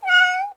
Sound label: bingo Cat; bingo Card App